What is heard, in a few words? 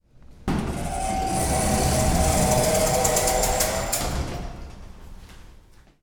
Elevator Door Opening